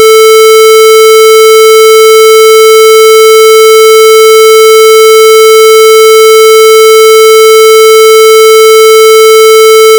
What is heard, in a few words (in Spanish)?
Cuadrada 440hz